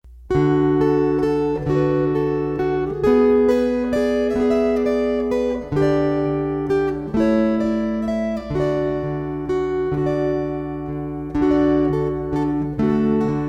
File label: Waltzes